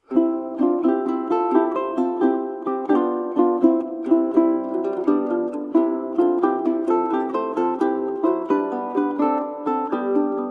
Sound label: Allegretto from Flute Trio, Ukulele and Guitar; Op 133 No 1; James Hook